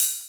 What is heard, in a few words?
Ride; Equipped Music; Loungin House